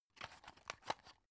drug close; arzt

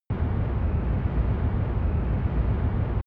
spaceship engine idle noise; raumschiff

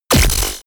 sci-fi plasma cannon fire

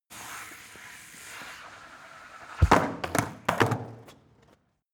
skateboard indoors slide drop; sport freizeit

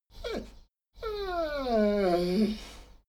dogo argentino whine medium; natur-tiere; hunde

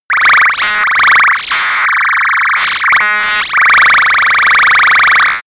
high load, alarm; soundpool

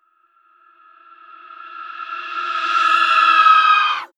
wraith attack reverb; schreie

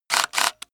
screwdriver on load; maschinen; schrauben drehen hydraulik

